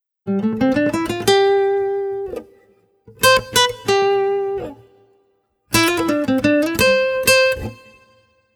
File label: Nylon Solo; лёгкая музыка